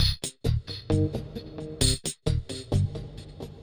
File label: Funky 125-132 Cm7